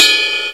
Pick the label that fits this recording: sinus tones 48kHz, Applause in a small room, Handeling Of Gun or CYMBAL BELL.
CYMBAL BELL